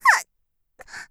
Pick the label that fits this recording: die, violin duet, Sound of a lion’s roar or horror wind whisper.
die